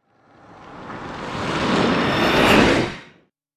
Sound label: Sting Too Close; Brand X Music; Brand X Music Catalogue - Editor's Essentials